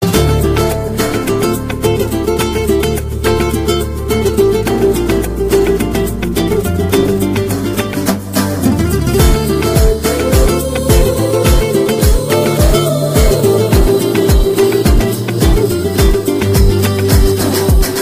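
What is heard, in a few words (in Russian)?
horoshaya gitarnaya muzika, good guitar music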